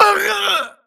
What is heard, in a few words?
grunt death neck; Titanfall 2